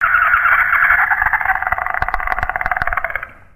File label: Raptor Call